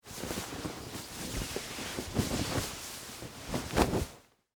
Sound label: Wool Coat Put On, Clothes; Foley; Dressing (Sound Effects:Human); BlastWave